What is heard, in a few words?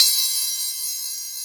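hi-hat sizzle, drum machines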